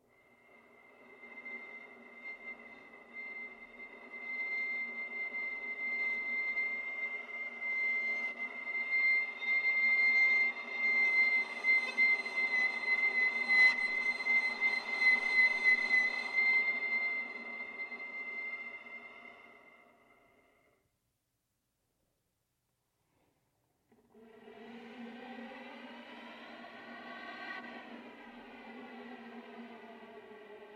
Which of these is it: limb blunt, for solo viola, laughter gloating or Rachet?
for solo viola